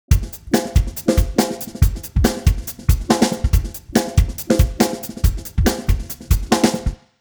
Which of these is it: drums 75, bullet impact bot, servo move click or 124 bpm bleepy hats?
drums 75